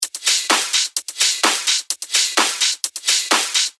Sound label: 128 BPM